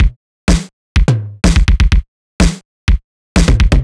drum loop monday stripped 125, Classic Chicago House; Sample Magic; Drum Loops